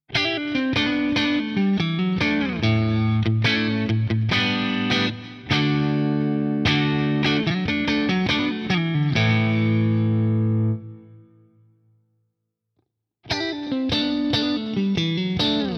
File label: Clean Single coil, guitar; v-series v155t-5